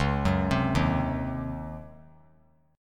C sharp Major 7th Suspended 2nd Suspended 4th, C sharp, chord; arpeggiated; 13,13,15,13